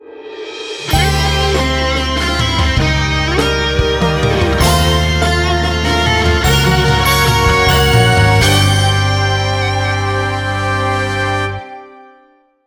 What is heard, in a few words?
Course Intro Fanfare; mario kart tour original soundtrack